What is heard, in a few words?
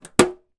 foley small metal case tin lock click; zapsplat